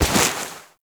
Step Snow, Footsteps; Enemies; Foley; Warframe; Duviri; Dax

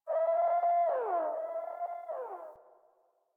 Venus Landscape Distant Robot Call Low; Ambience; Warframe; Venus Open World

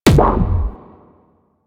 Air Pistol Hit Effect; Warframe; Corpus

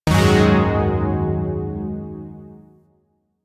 Shawzin Yo Chord E; Zariman Shawzin

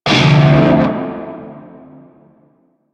Shawzin Maj Chord D; Warframe; Narmer Shawzin

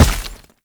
Heavy Step Dirt, Footsteps; Enemies; Foley; Warframe; Tau Dax

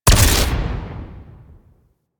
Proj Explosion; Enemies; Sentient Dolicholyst

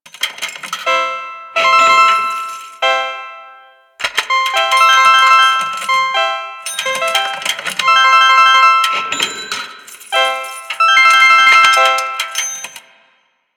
Slot Machine Loop; Warframe; Ambience; Nineteen Ninety Nine

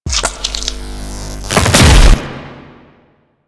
Melee Mid Spin Flesh; Warframe; Infested; Ancient

